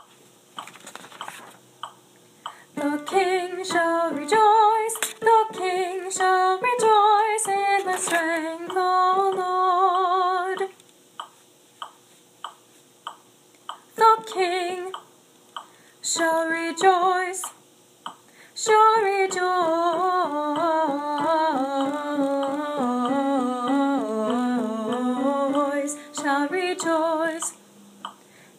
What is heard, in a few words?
alto